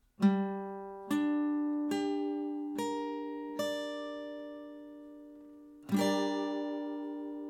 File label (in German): G Moll Barre